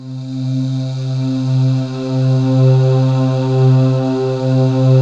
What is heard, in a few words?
VOCULARS; Pro-Rec - Synthsonic; JV FILM